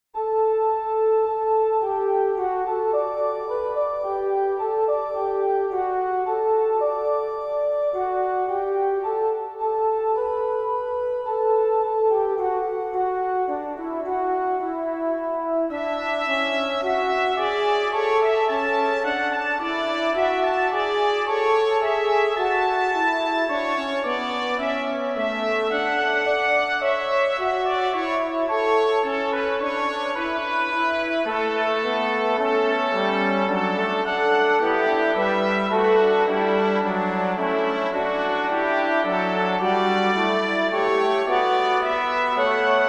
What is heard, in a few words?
Tutti